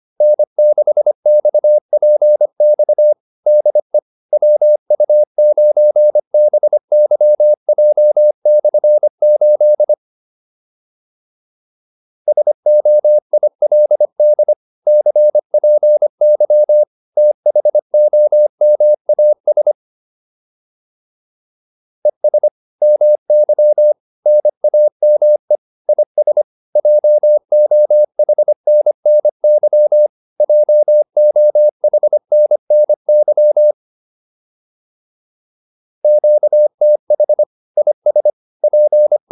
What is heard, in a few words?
QSO, advanced practice files